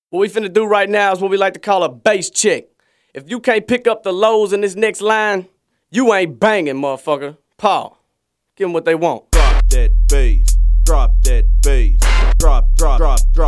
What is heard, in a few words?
Bass Check